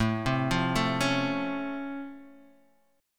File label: C sharp Augmented Major 7th A, chord